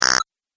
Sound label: Alien Robot Game Fx